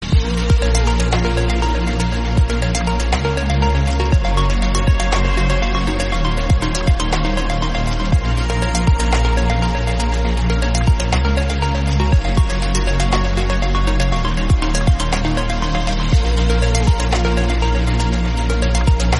samsung galaxy s20 ultra, ringetone

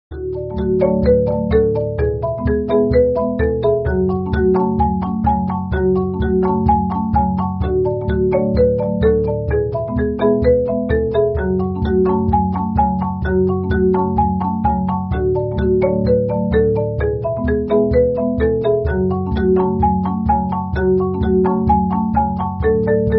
on marimba

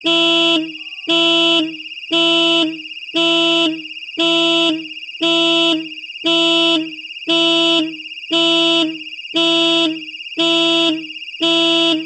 alarm, ringetone

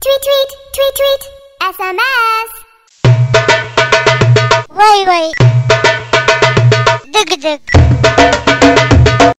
Tweet Tweet Sms

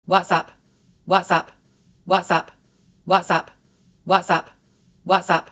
Whatsapp, ringetone

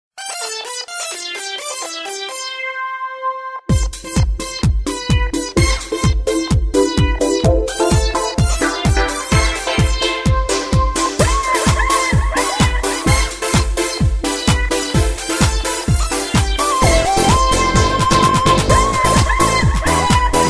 Nokia Groovy Mix, ringetone

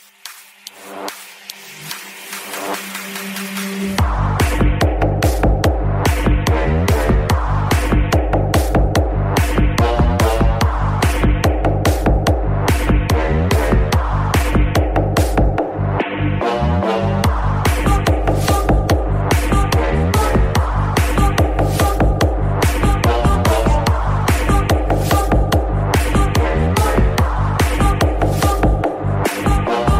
remix

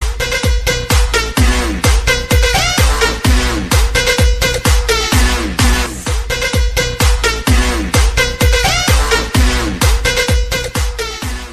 iphone 12 nonstop

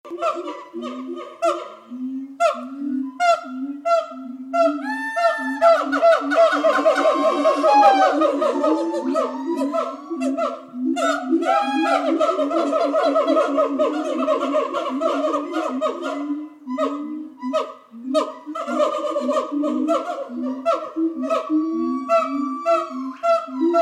Gibbons, ringetone; mobiltelefon

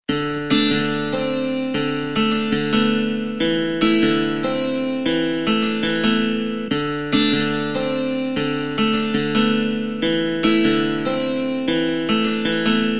guitar chill out

